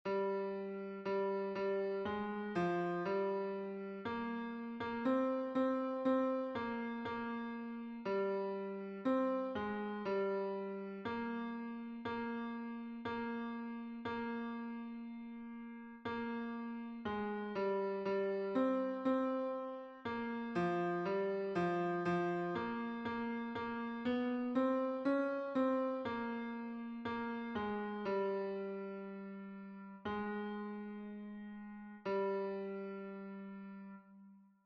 tenor, himnos en piano; 64 GLORIOSO CRISTO; cantos del camino

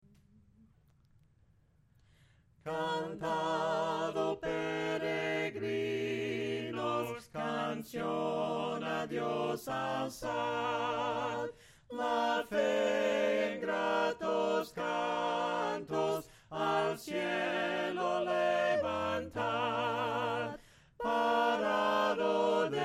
CANTAD OH PEREGRINOS, himnos a capella; cantos del camino

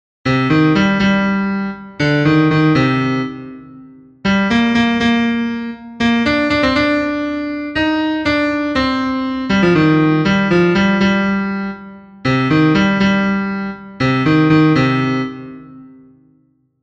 tenor, himnos en piano; cantos del camino

